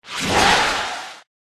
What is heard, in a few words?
Dark Spirit